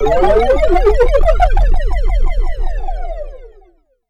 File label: Retro Charge